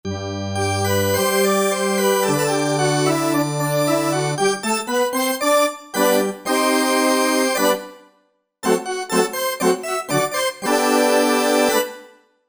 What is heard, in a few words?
Dreh-Orgel, Korg MSC-9S Organ